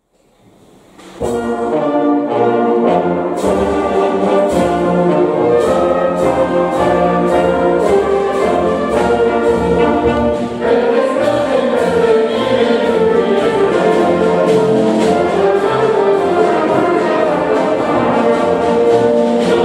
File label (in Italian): La campana di San Giusto Filarmonica Coro; Alpignano; Coro ANA di Collegno